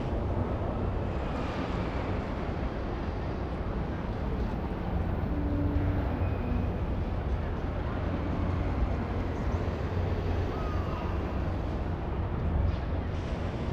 Outside Ambience; Dexster's Diner; Lego Star Wars (PC)

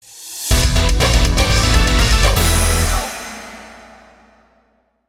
Battle Outro; Paper Mario - The Thousand-Year Door (GC DSP); Glitz Pit

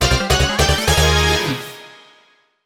Correct!; Paper Mario - The Thousand-Year Door (GC DSP)

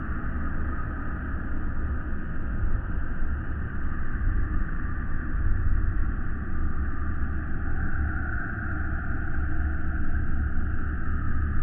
ambience; Darth Maul; Lego Star Wars (PC)

